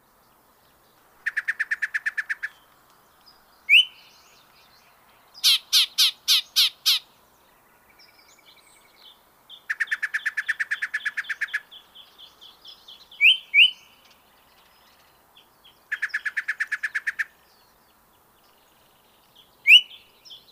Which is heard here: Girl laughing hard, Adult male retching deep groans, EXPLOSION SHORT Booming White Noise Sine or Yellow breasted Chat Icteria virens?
Yellow breasted Chat Icteria virens